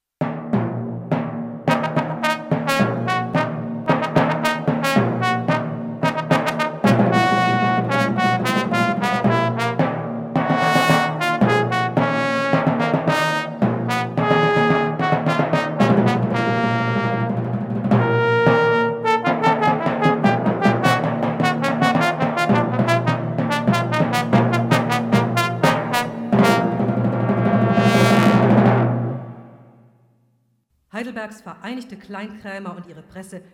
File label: Fanfare